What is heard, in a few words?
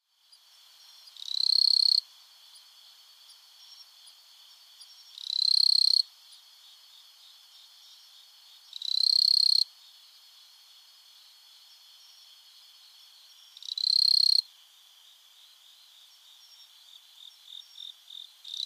kuchiki koorogi